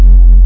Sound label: buzz hum; quake2; inland